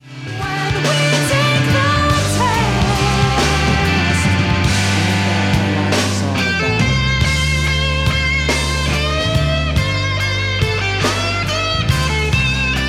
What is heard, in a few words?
guitar solo